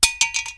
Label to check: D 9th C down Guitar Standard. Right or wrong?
Wrong